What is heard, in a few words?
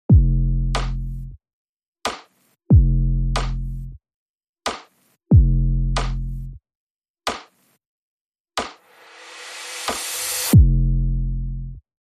Drums Only